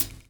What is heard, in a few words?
drum chop; AMG Gota Yashiki Groove Activator